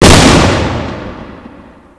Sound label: explode